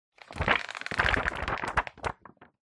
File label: Magazine Flick Through